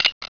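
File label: button press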